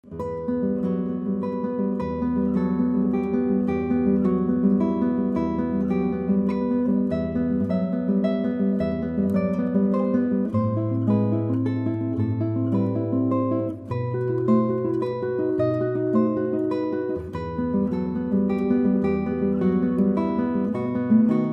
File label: spanish, classical guitar